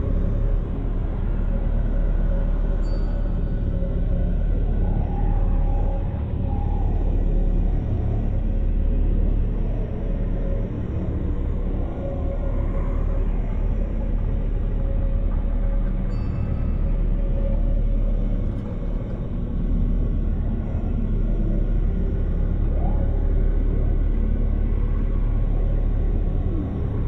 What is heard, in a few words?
ship starbase idle; Stellaris; shroud